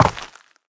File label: step run, footsteps, player, dirt